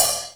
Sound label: M1 OPHAT 1; Greytsounds VOL-1